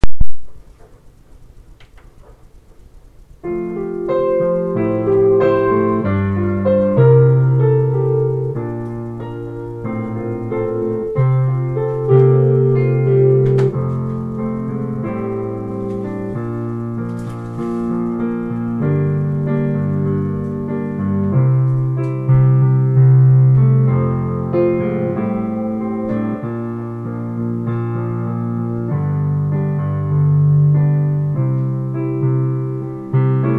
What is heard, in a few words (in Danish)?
julen er guds - akkompagnement